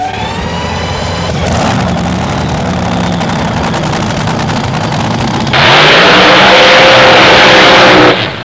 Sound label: Drag Sound